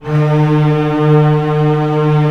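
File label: STR A1 FF, strings; Sound On Sound - Peter Siedleczek's Orchestra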